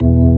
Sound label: ORG VK, B-3 ORGANS Vinta Keys B3